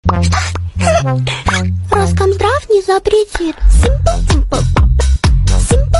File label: simple call song ringtone